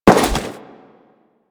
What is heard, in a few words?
Crewman Step Default, Footsteps; Warframe; Corpus